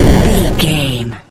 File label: retro explosion deep